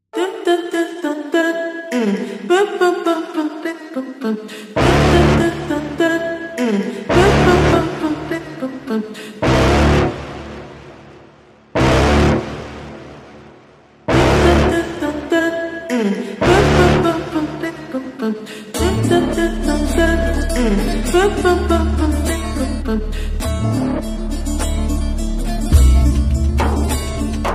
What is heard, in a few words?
bgm irritated; ranbir k